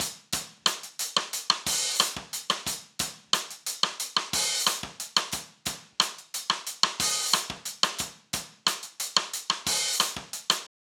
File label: drum loop groove 90